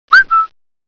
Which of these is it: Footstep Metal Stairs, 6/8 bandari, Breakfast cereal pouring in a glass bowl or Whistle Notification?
Whistle Notification